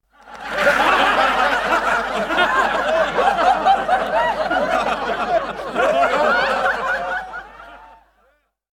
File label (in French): Rires de 25 pers